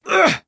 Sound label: Wound; Valanar